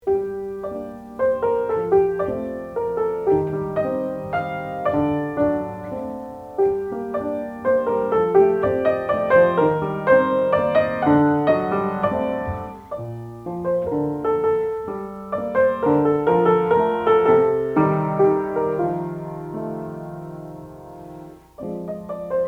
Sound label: piano